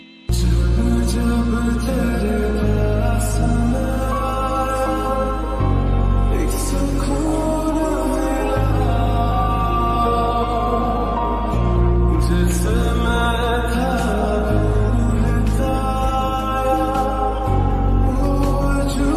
Sad Song Ringtone

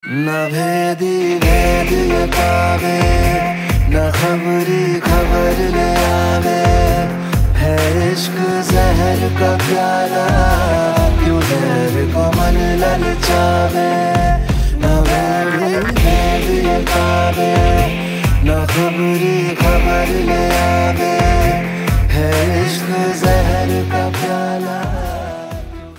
Ringtone Hindi

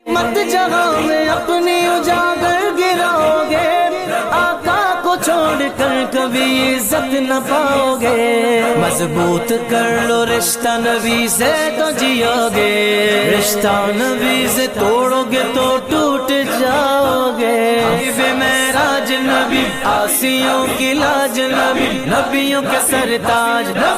Naat Ringtone

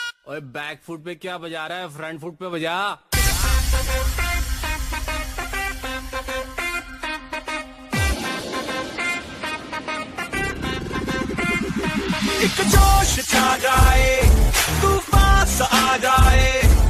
IPL Song Ringtone; Delhi Capitals

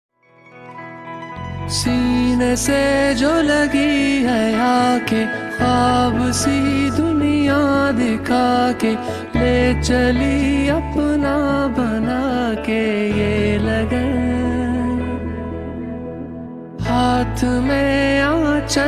Love Song Ringtone

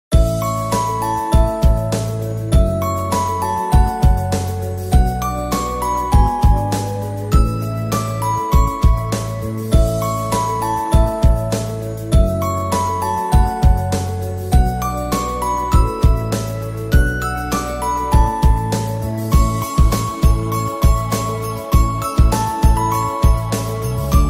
Christmas Ringtone; Jingle Bells

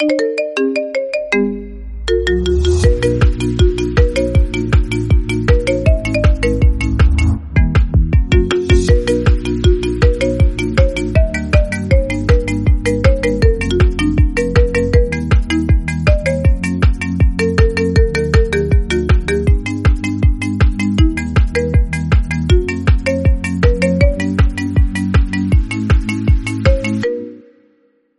Ringtone For iPhone; One Piece

